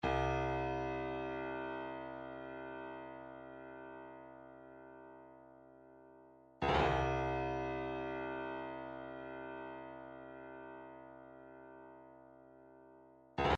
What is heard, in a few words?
142 Dumbek, midi